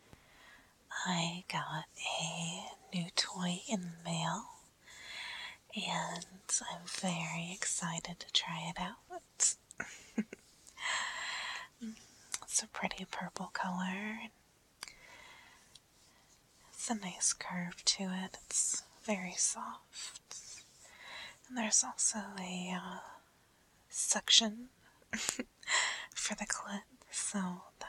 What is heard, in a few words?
squirting, wet noises, real orgasms, trying to stay quiet, toy; F4M; New Toy Testing; needy